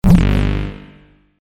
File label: Guitar Gun Shot; Guitar Two